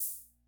OHH, sr-120; classic machines

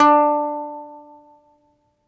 BALALAIKA, Russian Strings

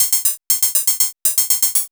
MOOG HAT; AMG - Vince Clarke Lucky Bastard

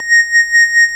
ORG Vox A#, Continental Vox Wah Bar; Roland LCDP09 Keys of the 60s and 70s 1

